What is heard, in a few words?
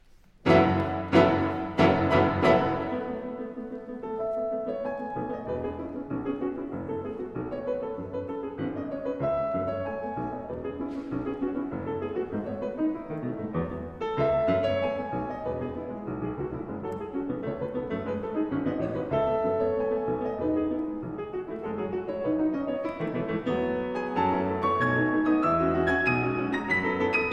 Souvenirs for Piano Four Hands, Galop; Barber; Op. 28